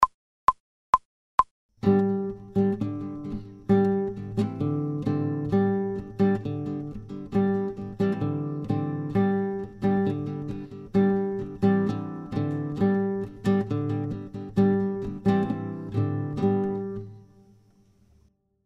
Another Another Rock Groove fast, without metronome